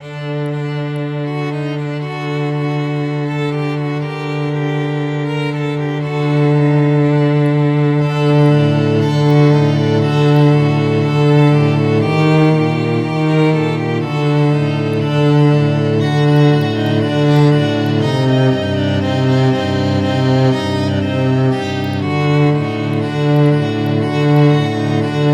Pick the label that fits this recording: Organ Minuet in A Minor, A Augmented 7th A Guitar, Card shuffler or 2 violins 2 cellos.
2 violins 2 cellos